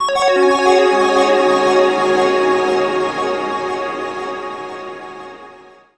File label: match large, chimes; Audiosurf